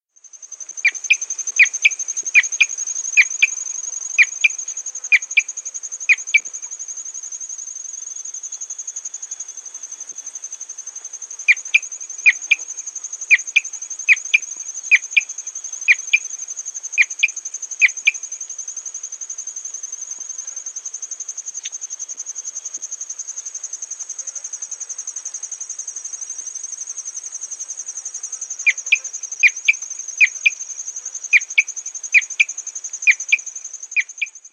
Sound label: Marsh Antbird; S. America